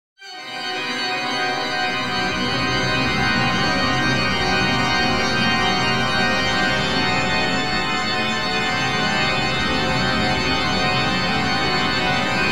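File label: impro, organ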